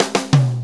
4-4 Fill, Drum Loops; Fills; Sonic Foundry; Drum Tools

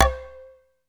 PERC C4; SEQ AND PERC; Music Store - Claudius Diemeter Schwerlos

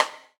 GATE RIM, Drums Acoustic; USB Soundscan vol.10; AKAI; 90s sample CD; GATEKIT 1

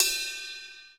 crash 1 bell, drum kit; KITS-SMALL

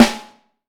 Snare Drum; Q Up Arts; Johnny.C's Vintage Snare Drum; 5X14

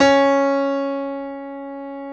Piano C#3; Proteus 2000; Multisamples; Digital Sound Factory Proteus 2000

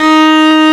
D#2, Hardng Fiddle

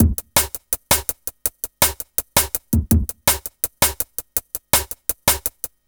165 BK, Explosive Jungle; 165 BREAK; USB Soundscan vol.05; AKAI; 90s sample CD

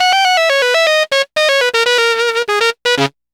Sax Line, Synthetic Winds; Funk; Sonic Foundry; Dr. Fink's Funk Factory

